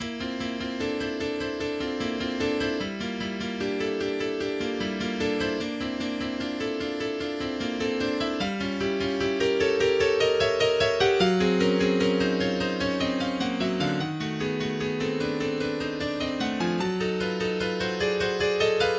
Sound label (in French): exercise pour piano; Petit exercise pour piano No 6